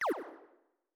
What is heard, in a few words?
Small Laser